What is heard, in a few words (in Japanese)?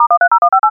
pipopa